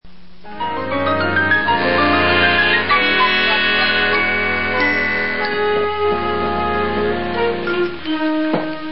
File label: music